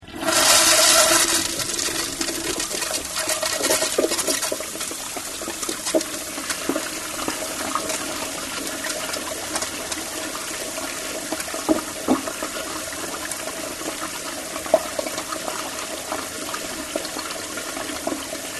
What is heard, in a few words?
siphon